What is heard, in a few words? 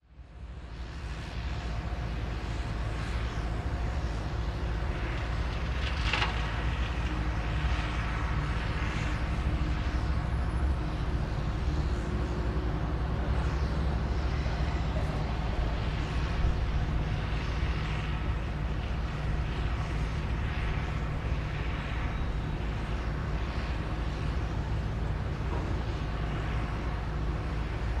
Construction works industrial city skyline ambience